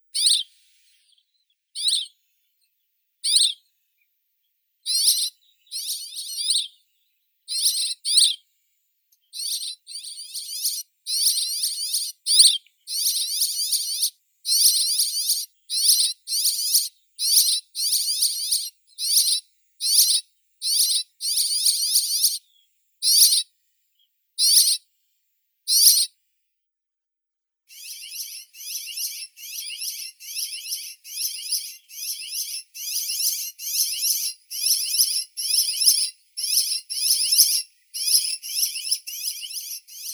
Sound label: vorobei kamennii petronia petronia